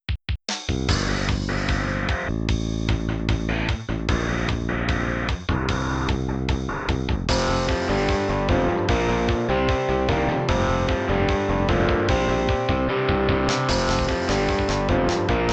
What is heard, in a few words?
Guitar Challenge; the sims bustin out; gba